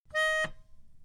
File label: Melodica One Note